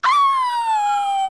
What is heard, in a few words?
die; Wolfos